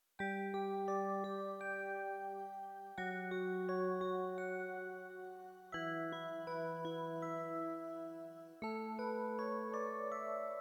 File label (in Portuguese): musica